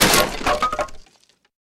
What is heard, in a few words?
Wooden Fence Break